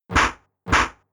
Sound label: Sucker Punch; attack moves; Pokemon; Gen 4; DPPL HG SS